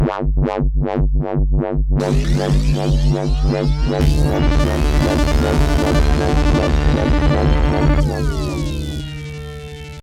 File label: Sounds For Dubstep